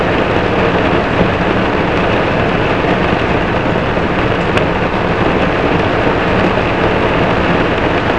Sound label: Rain, Aircraft A320